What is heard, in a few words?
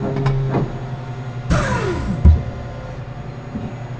ambience